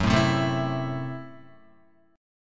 Gb Minor 7th Flat 5th, Guitar; Gbm7b5 strummed; 1,0,0,2,0,0

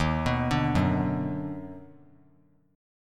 D sharp Minor Major 7th Sharp 5th; arpeggiated

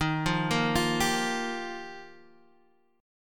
G Minor 7th Sharp 5th, Eb

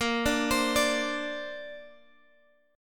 A sharp Major Add 9th, Guitar Open G

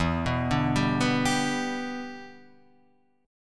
G 6th E

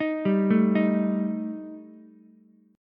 Eb Major Flat 5th Eb Ukulele; EbMb5 arpeggiated; JGuitar

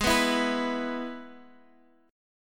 Ab Minor Sharp 5th Ab

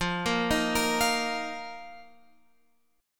F 7th Suspended 4th F down Guitar Open F; arpeggiated

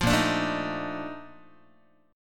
Bb Major 11th Bb Guitar; strummed

